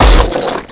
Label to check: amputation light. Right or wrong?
Right